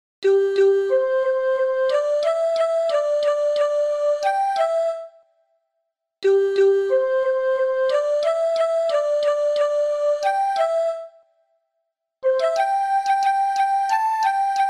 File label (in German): Es klappert die Mühle C-Dur 90bpm; Volkslieder